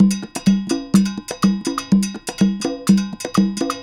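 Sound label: 125 Dance Latin Percussion; Dance DJ; CongaBongo; Pro Tools Essential Loops; Digidesign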